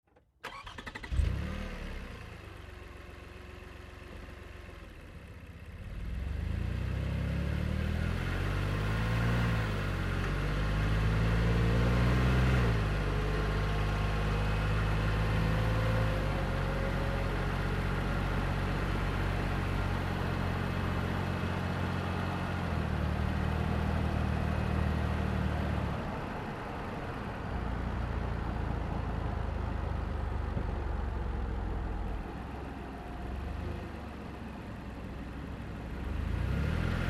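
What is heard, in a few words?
Car Highlander Onboard Drive Slow; 2005 Toyota Highlander V6; Cars; Vehicles; Big Room Sound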